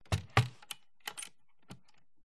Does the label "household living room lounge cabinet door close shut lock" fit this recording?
Yes